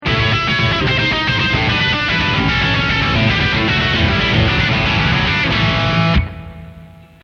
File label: Heavy Country